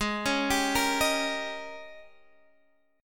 Ab Major 7th Suspended 2nd Suspended 4th Ab Guitar Drop D; x,11,11,12,11,11